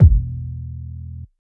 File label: puffy bass drum one shot b key, Royality free kick sound tuned to the B note; kick drum samples